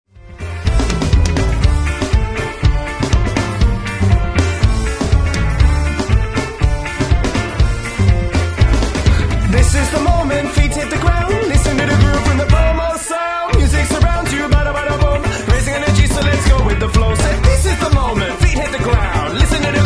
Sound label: swing